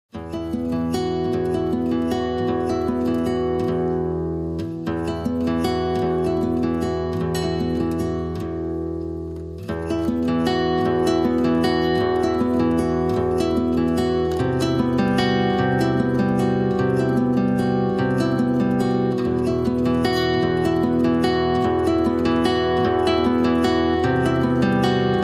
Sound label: Guitar Only